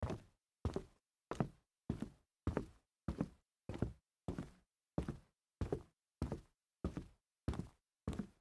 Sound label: walk solid wood, foley